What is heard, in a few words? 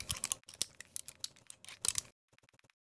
spanner idle; weapons